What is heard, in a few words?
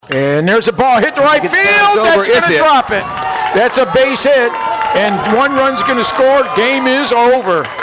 game over